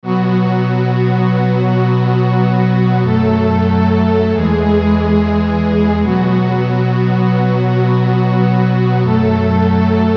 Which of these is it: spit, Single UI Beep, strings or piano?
strings